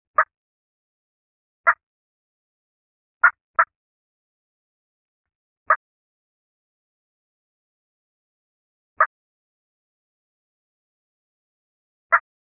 Hen Cluck, Turkey

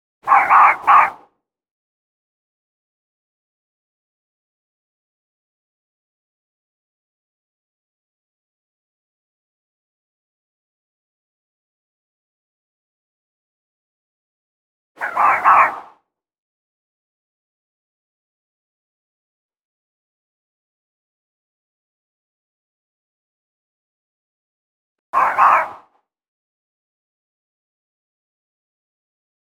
Fox barking distance

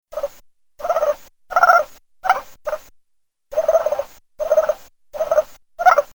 Raccoon puppies; ORION

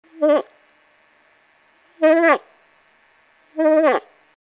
Cow Come Get Me Big Boy, Moose

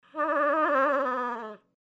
Cow In Heat Short, Moose

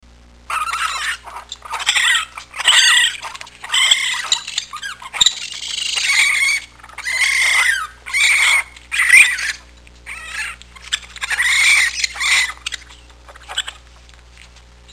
Raccoon babies

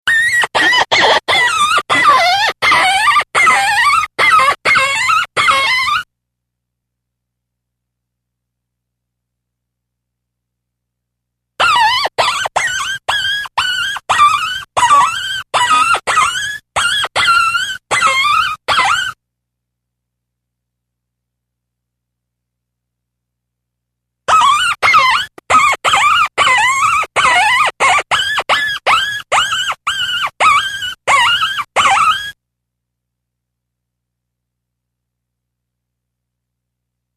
Fox & coyote puppy; ORION

